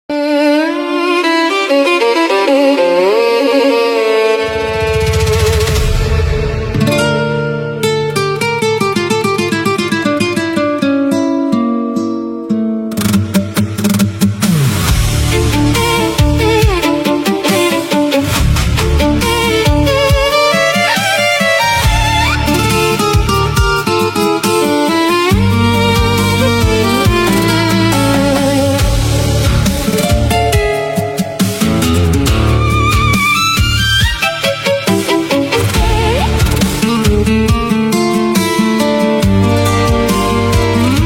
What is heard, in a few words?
tango